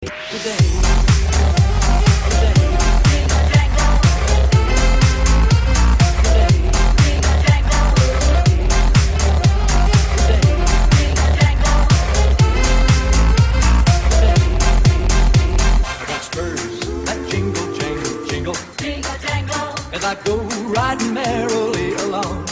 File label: Jingle Jangle